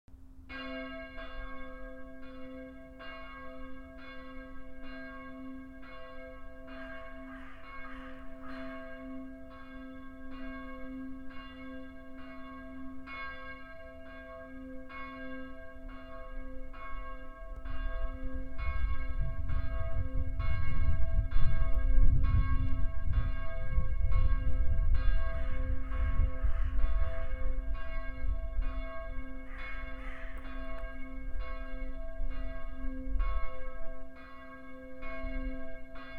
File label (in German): taufglocke